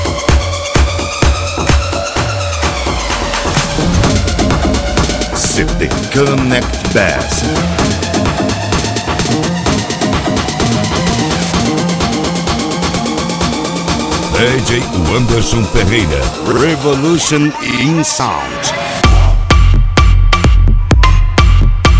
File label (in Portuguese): bass house e rap